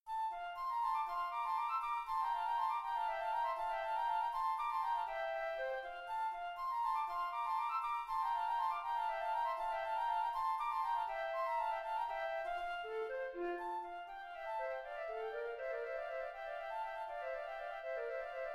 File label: flutes; sonata no.1 4th mvt